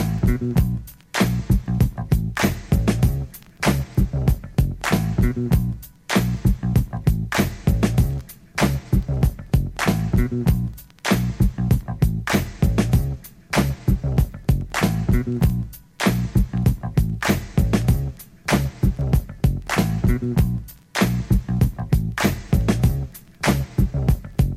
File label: heartbeat bass